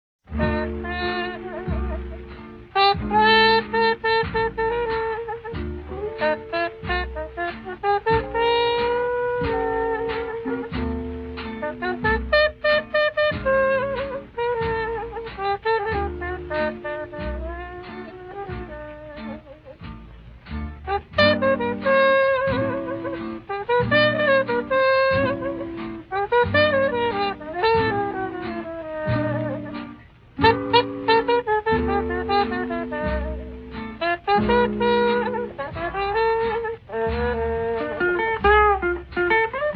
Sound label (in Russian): Мелодии трубы